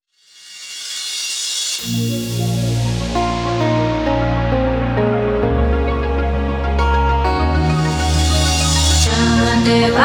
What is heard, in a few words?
Radio Night MIX